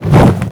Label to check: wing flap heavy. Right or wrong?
Right